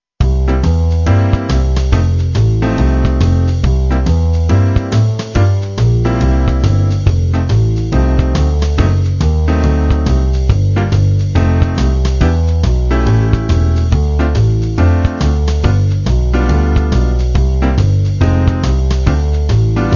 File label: blues